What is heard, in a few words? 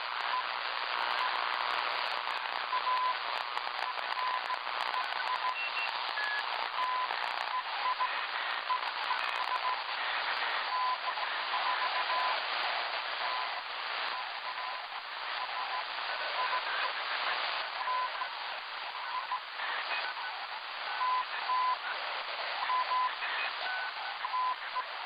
intermittent noise 7058 am; signalink; dealing with local rfi